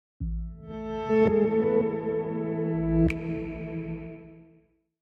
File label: Corporate ethereal logo intro